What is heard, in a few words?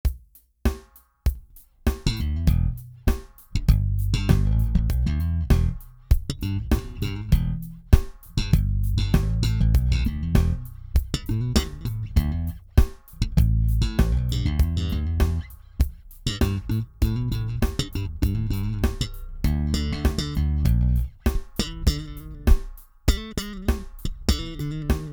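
Fodera slap, bass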